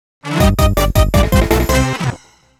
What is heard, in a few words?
Enemy Course Fanfare; New Super Mario Bros. Wii